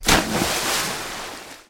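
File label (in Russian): краткий тяжелый всплеск воды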